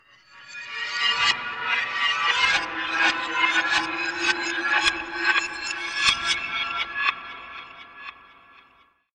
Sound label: Strange Drone